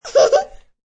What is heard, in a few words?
Toddler Laugh; Laughter Sound Effects; Voice